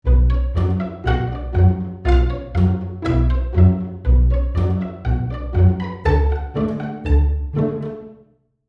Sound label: Studio Small, pizz; Hall of the Mountain King; Spitfire